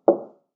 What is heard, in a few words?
knock